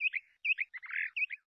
Bird, ambience

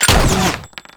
rifle; Weapon

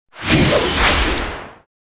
Destroyer attack

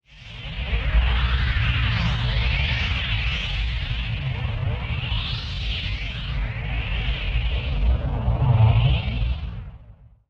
Siren, ambience

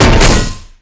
DBgun Metal, Damage

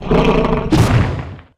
ATTACK; LockJaw; Mob

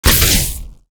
Gun Skin

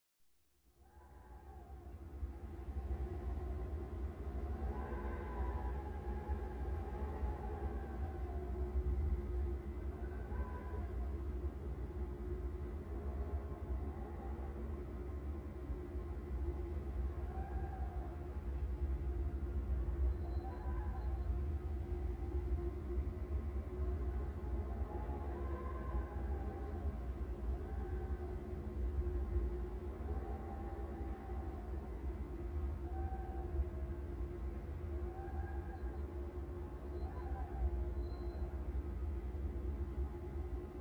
Cave Wind, ambience

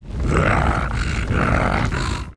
WAR WALK

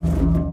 Armed Ghost

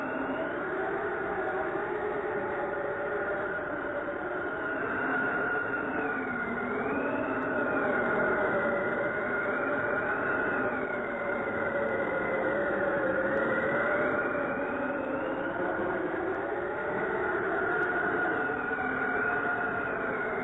Snow Wind, ambience

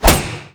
throw metal, Damage

